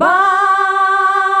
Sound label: "bah", females; Best Service; Hyper Dance